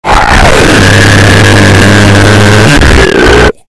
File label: auugughhh